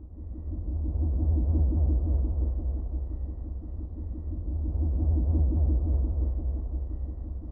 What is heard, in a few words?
Temple, ambience